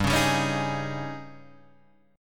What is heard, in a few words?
G sharp Major 7th Suspended 4th, G